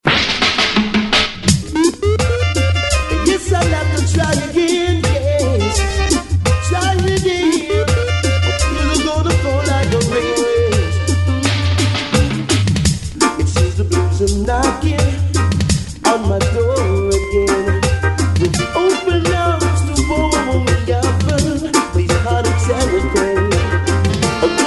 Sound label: SAX